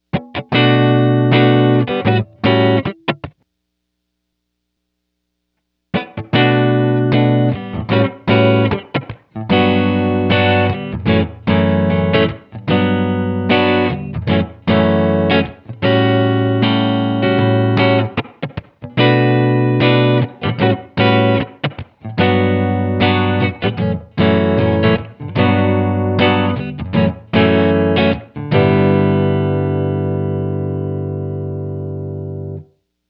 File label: reflections; BigSky; reverb; Velvet Drapes